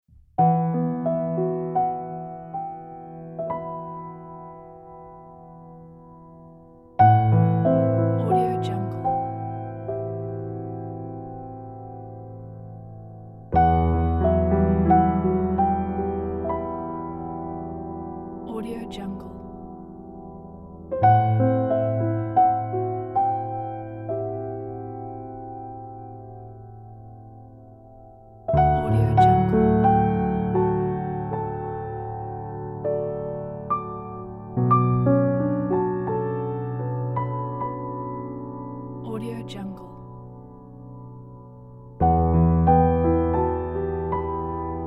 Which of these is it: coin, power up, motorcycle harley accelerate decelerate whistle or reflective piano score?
reflective piano score